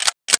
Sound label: mp7 mag in, weapons